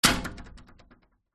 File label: household swimming pool door glass close bang wobble